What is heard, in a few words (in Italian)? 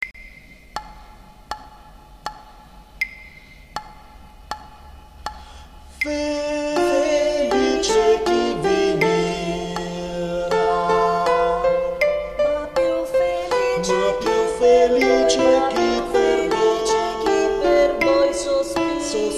Soprano; Altre Voci